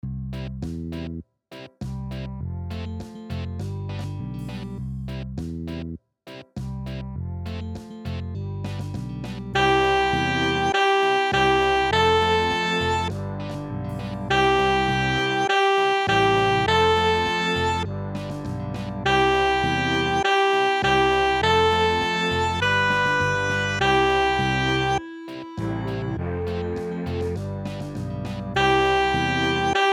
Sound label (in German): reaggy fuer saxofon